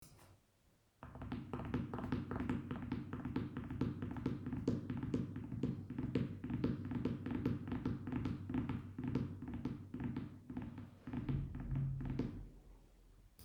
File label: horse run; dinorun